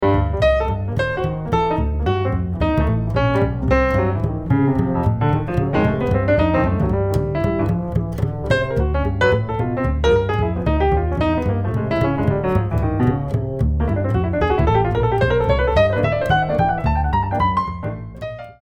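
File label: piano solo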